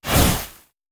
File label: Fuse Black Powder; Sound Ideas; Guns